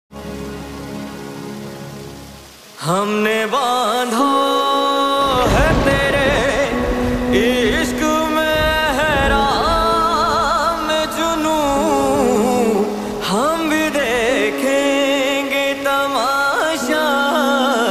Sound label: Pakistani drama ringtone